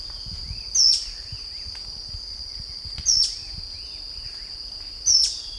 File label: Synallaxis cinerascens contact